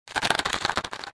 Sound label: scuttle, mine